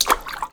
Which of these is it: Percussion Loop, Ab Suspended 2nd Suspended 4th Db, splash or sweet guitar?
splash